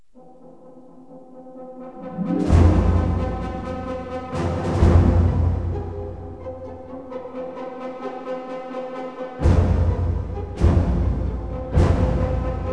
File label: Logo; Hidden & Dangerous